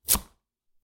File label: Cutting Board Vegetable Chop; soundscrate